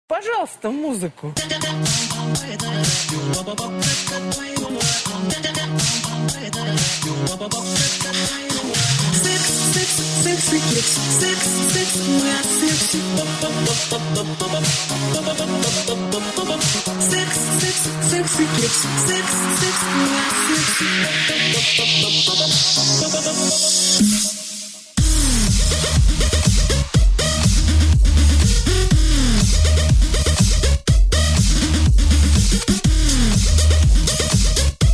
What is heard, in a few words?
hard dubstep